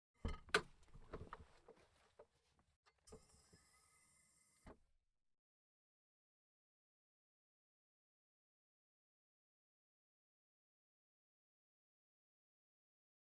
剃须，然后剃掉脸上的绒毛, ASMR

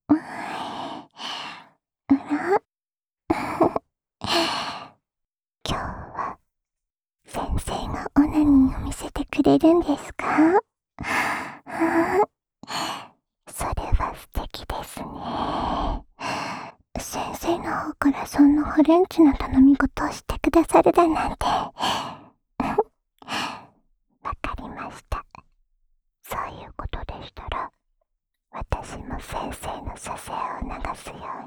浅浅的舔耳循环（右耳）; 辅助自慰用

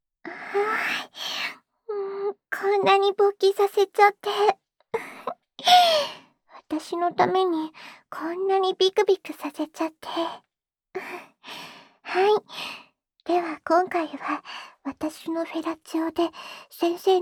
浓厚口交循环; 辅助自慰用

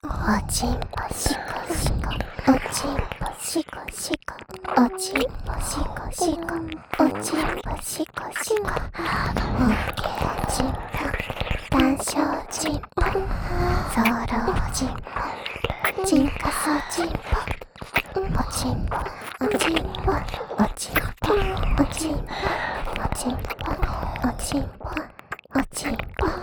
紧贴轻语侍奉 双耳亲吻舔耳, ASMR; 自慰辅助特典音声

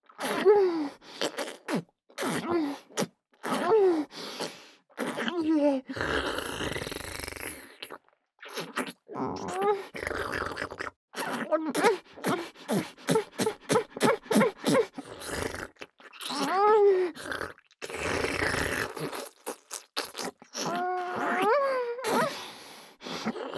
吹嘴 激烈吹嘴

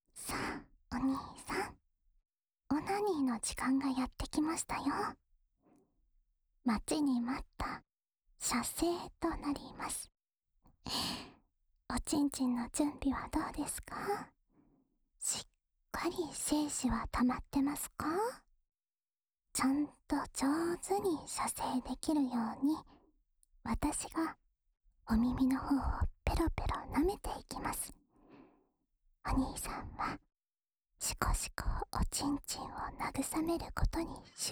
稍含唾液的舔耳（右耳）; 辅助自慰用

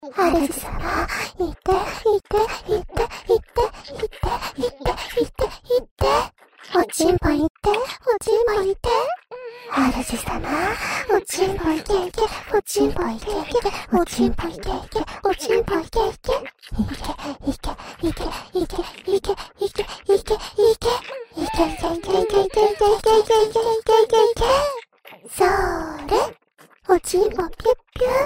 主人♪ 肉棒射射♪ 肉棒快射射～～♪ ＋ 深喉嚨口交; 自慰輔助用特典音聲

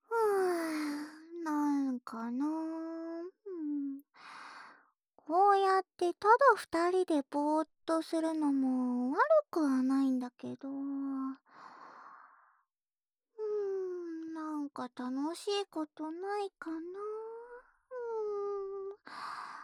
即兴舔耳（右耳）, ASMR

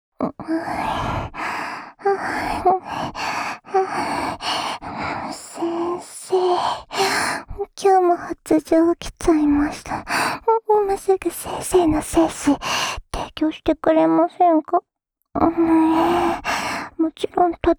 深深的舔耳循环（左耳）; 辅助自慰用

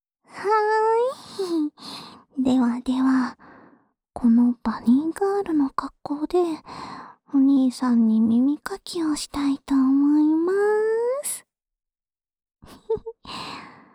高級掏耳棒 左

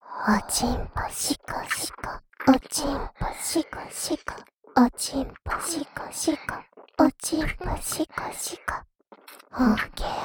紧贴轻语侍奉♪+亲吻; 自慰辅助特典音声

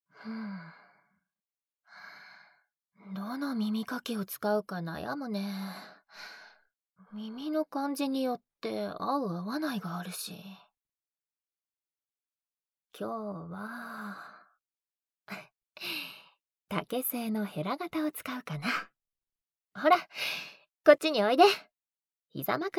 掏耳朵（湿毛巾・棉签・竹制掏耳勺）, ASMR

